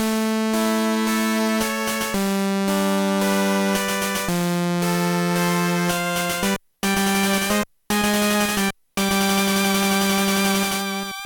Placeholder Fanfare

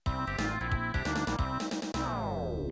Unused Jingle; Tetris 2

